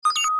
detonator deactivate

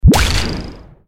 powerup summon strike

